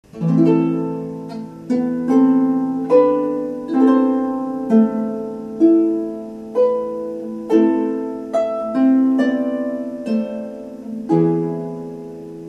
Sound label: harp